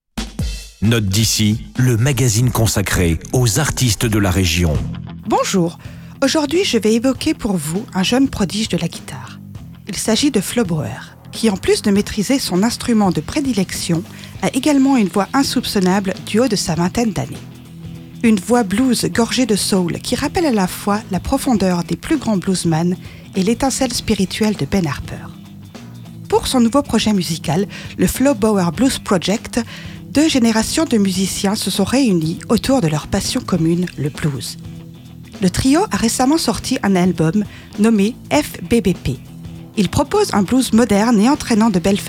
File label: Blues; Note d'ici, chronique musicale